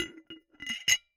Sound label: Bottle Struck